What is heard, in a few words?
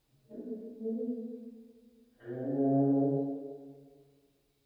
bird budgie song; ambience; dfcaverns